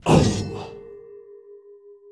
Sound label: crystal appear